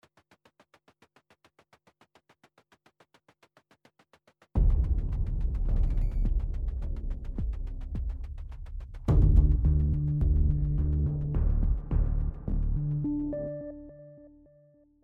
Bunker Scifi Intro Low

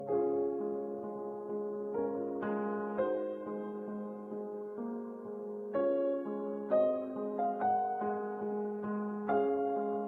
Chopin Raindrops; besiege

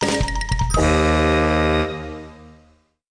Win Sting; curious george downtown adventure macos windows gamerip 2002

